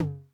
Tom Hi Mid Tune Mid Decay, 909

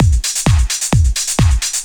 drum 130 trance

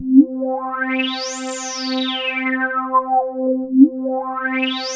Korg POLY800 C3; Back In Time Records; Korg Universe VOL-1

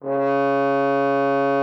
C#3, FR HORN; Xsample VOL-3

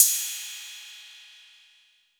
Roland 808 CY

